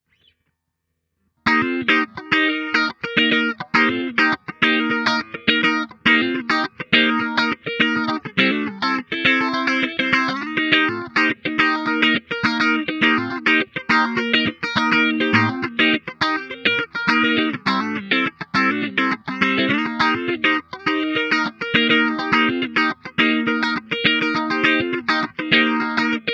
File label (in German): funk hals